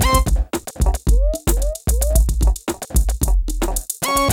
BEAT, Beats 105-150bpm

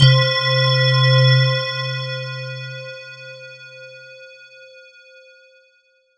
C2, Roland JV 2080, JUNGLE BRASS

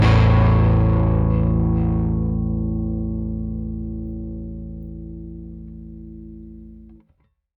Rickenbacker Bass; Airwindows; Rick-Xtras

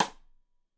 Side Stick Stereo; Deeply Sampled Drums

